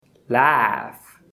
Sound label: laugh